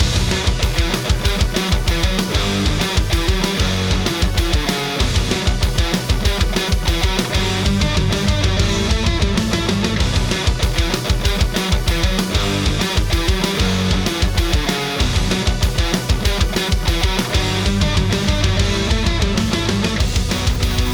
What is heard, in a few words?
Loop; Initial 2 New Stage; Ironclad